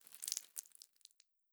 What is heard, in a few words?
Blood